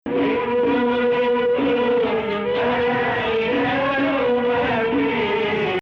Rast Sihtu 2nd Rast

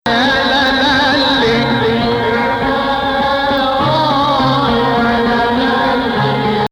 Rast Ya Shadi Nahawand

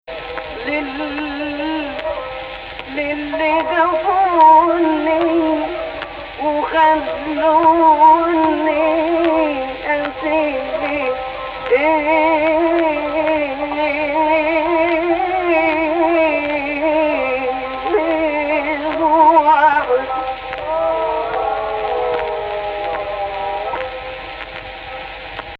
AHH, Egypt Rast

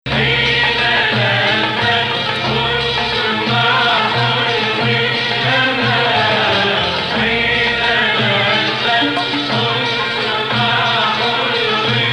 Sikah Jadaka 2nd Rast

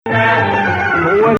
Nawa Athar Gameel Rast; Maqam Nawa Athar

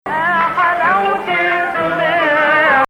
Ajam

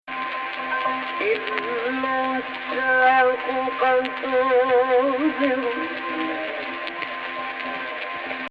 AHH, Egypt Rast; Jins Rast

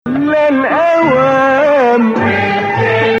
Nawa Bayati

